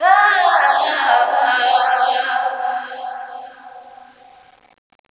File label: Female Vocal; Futurist; Sonic Foundry; Hip-Hop Street Beats Construction Kit